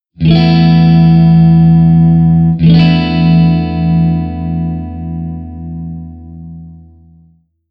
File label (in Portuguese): Campo Maior Acordes E4 E74